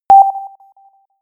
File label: notification signal; kommunikation; signale